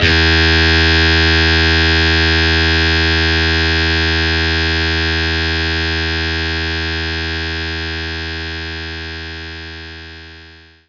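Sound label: Distortion Guitar E2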